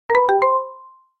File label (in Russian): prostoj signal na sms vertu